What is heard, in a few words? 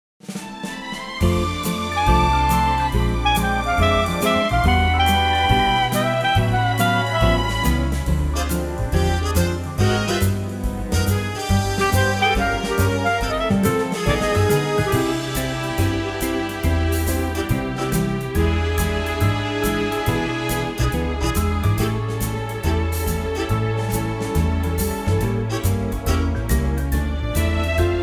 orch